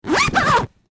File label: Quick Zip Pull Open And Close Zipper